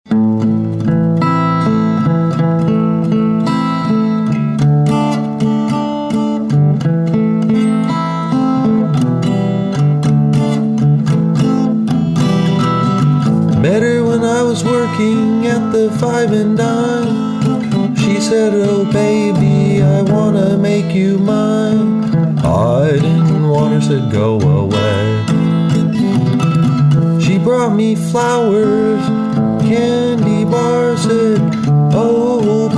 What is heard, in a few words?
go away accoustic